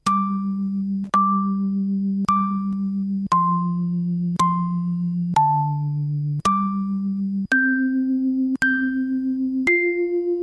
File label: perc chorus